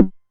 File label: Snare, Novation Drumstation 909